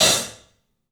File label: club hat; 3D Sound; 3D Drums